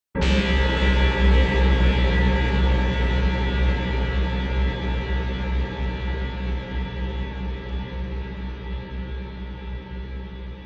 horror hit dark fear buzz metal atmos

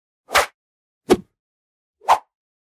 Swishes

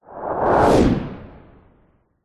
sound design cinematic short whoosh; zapsplat

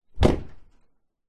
vehicles suv door close in garage nissan patrol

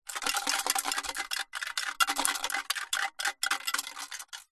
foley drinks can empty shake ring pull inside

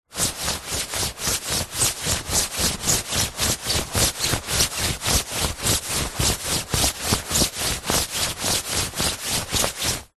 foley clothing thick jacket running movements

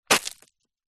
shoe kick gravel stones on road or path; zapsplat; foley

